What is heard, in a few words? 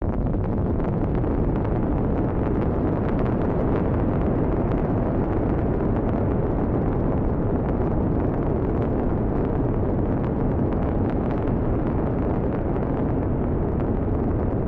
launch